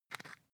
floss close compartment soft; haus-garten; badezimmer

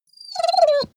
dog whine trill; natur-tiere; hunde

